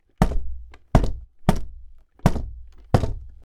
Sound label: Wooden Object Thud